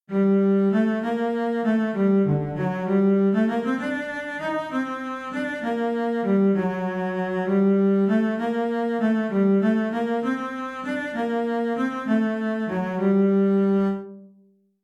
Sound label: Melodic Dictation